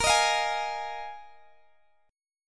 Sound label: Bb 7th Suspended 4th, Guitar, Open G